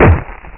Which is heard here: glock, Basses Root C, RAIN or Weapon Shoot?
glock